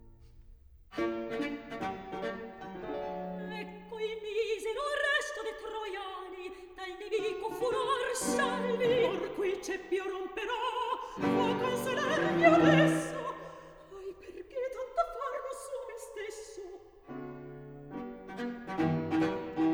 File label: opera, Recitativo